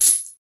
chain, magic and spell sound effects

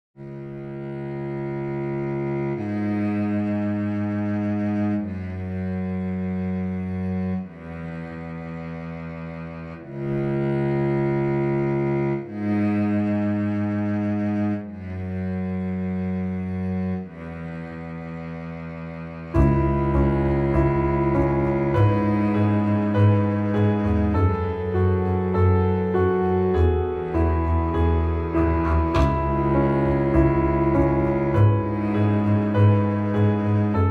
dramatic interlude; Classical

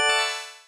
gold, Retro Game Weapons Sound Effects

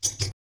sfx, futuristic weapons sound effects

